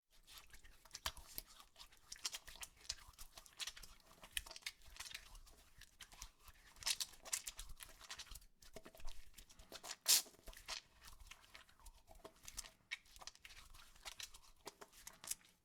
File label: washing hands no sink